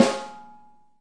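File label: snare, Multisampled Real Kit; REAL LIVE KITS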